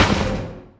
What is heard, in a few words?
asteroid damage metallic small; Factorio